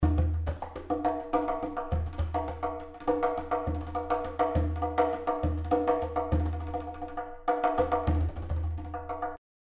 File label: African Ceramic Ashiko